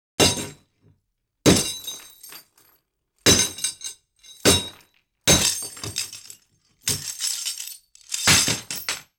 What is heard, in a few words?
metal clanks, foley effects; Asian Show Sound Effects